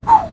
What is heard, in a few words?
whoop